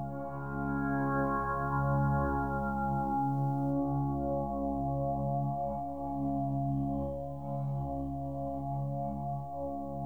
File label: keys, Sad Loops